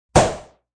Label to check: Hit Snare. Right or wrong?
Right